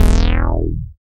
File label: BAS MKS-50, Roland